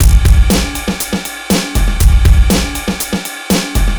Noise Beat; Drums & Drones

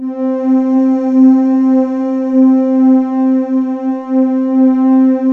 JV-1080 PEACEFUL; VINTAGE-EXP

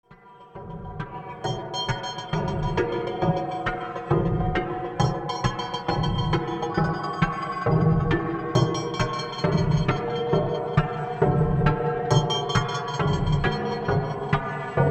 Noise Rhythm Sequences With Delay; Bizarre Planet

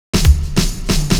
Drum Fill; Zero-G - Soundclash Nu Dance Electro; Acidized Wavs; Construction Kits; Smooth Circuitry

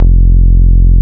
HOUS F2, HOUSE BS; Drums In Your FACE!

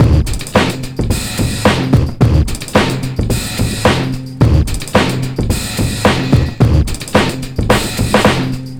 DRM, Breakbeat; Best Service ProSamples vol.24; AKAI

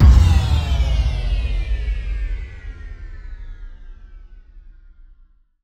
Impact; impact samples

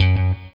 ROCK, Guitar

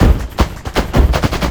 LOOP, 160 BPM, Drum & Bass

